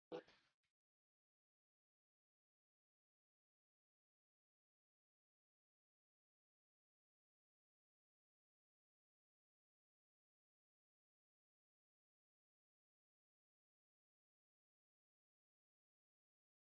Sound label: Dance Punjabi Ringtone